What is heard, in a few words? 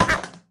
Drop Rubber Duck; Lethal Company